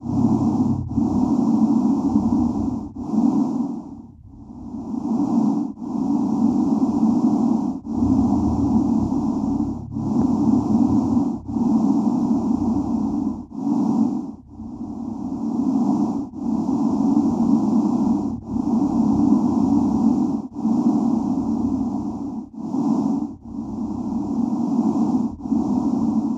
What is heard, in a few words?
alien breathe; aliens